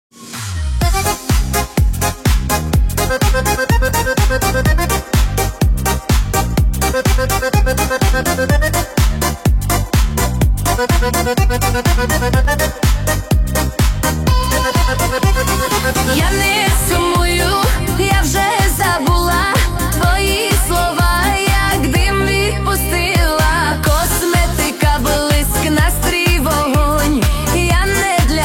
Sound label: amaya roma karaoke